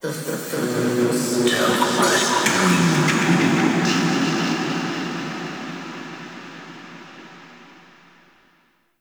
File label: cave echoes; Warframe; The War Within